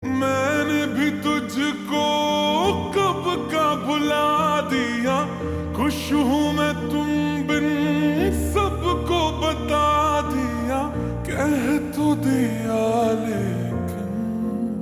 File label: Ringtone Slowed Reverb